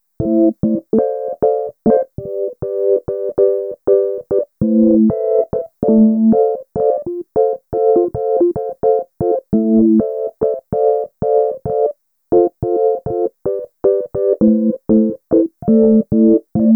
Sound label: Fuzzy EP Chords, Electro Lounge; Sonic Foundry (Sony Creative Software); 098 Savvy